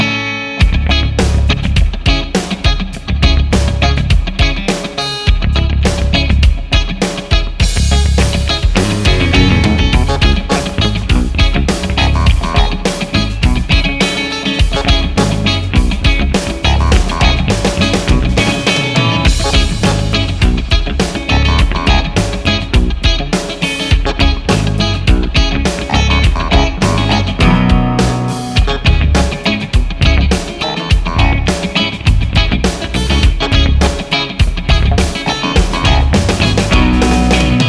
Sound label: Groove Loop; Focusrite